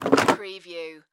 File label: Drawer Open Plastic; Sound Effects Bible